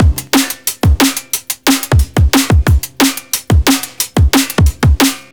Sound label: Resampled Breaks